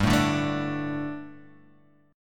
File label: G Suspended 2nd G, down, Guitar, Half Step Down